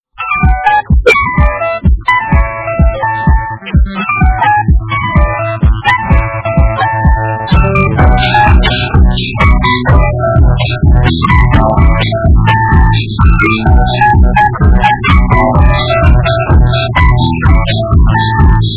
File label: ringtones nokia